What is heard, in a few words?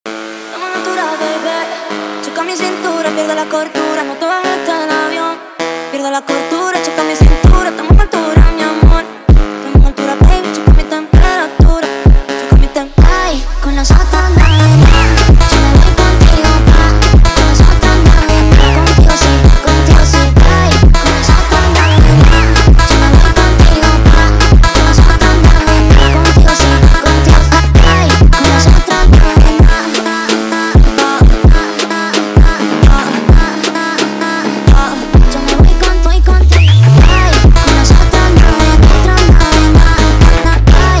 Phonk